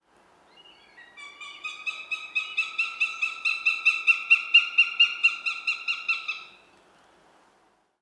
Northern Goshawk Accipiter gentilis

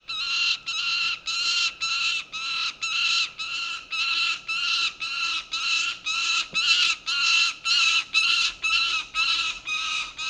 Peregrine Falcon Falco peregrinus

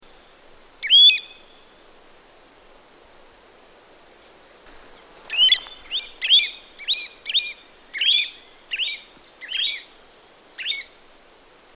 Pacific Golden Plover Pluvialis fulva

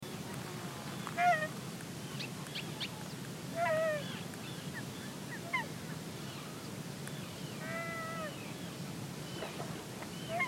Nene Branta sandvicensis

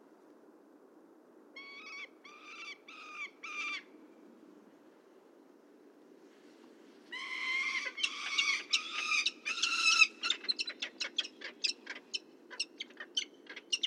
Prairie Falcon Falco mexicanus

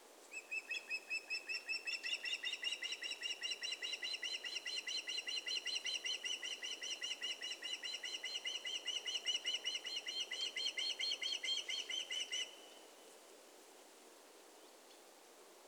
Western Osprey Pandion haliaetus

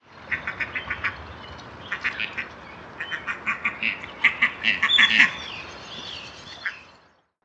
Gadwall Mareca strepera

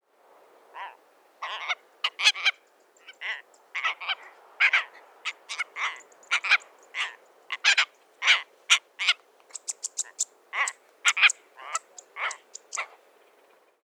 Red tailed Tropicbird Phaethon rubricauda

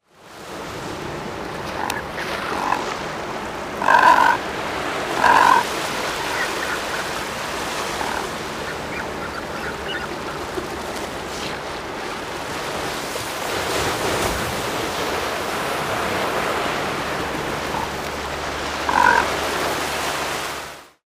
Brandts Cormorant Phalacrocorax penicillatus

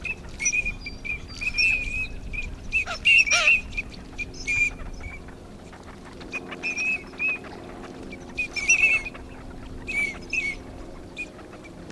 Green winged Teal Anas carolinensis

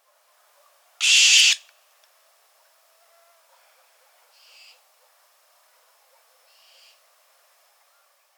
Lechuza Flight Calls

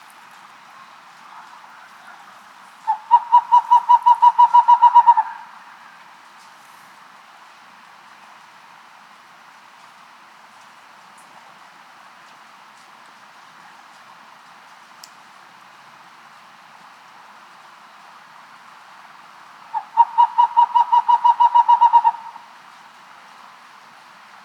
Western Screech Owl

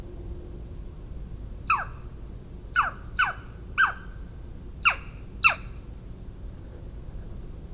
Northern Saw whet Owl Aegolius acadicus

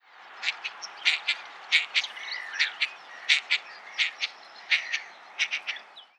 Northern Shoveler Spatula clypeata

